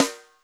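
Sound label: Snare Hi Piccolo, Alesis D4 drum machine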